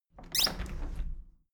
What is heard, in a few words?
window handle plastic squeak open soft; objekte; fenster